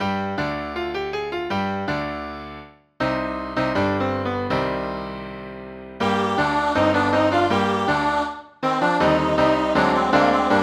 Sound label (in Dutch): Scheepspraat